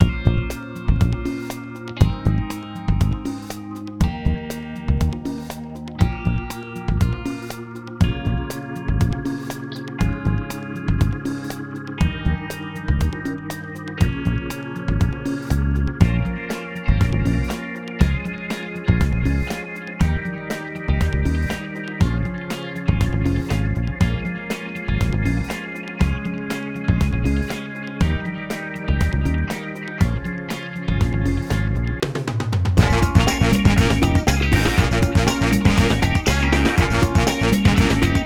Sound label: Question Music; Jackbox Games; The Jackbox Party Pack 5; YDKJ